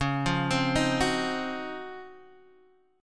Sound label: Db Major 11th, Guitar Open D